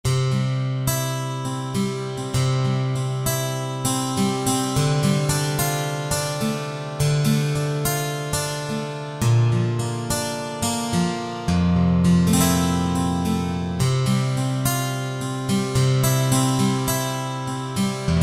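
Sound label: Guitar Brite Steel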